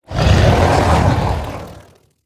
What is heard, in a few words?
Enter Play